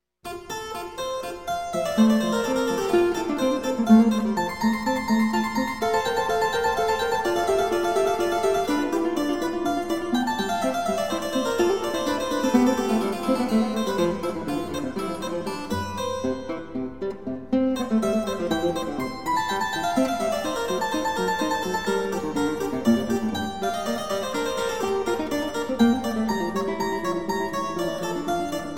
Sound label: F Major Invention; BWV 779; Invention No 8